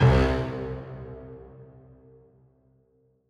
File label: piano stack C1, grime, 140bpm; Zero-G Sound Sense Grime Scene; GS Construction Kits; Its Primetime